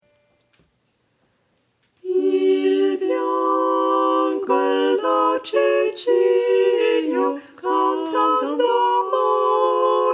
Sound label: tutti; Il bianco e dolce cigno